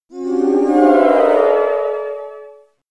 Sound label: Startup; GameCube System Sounds; GC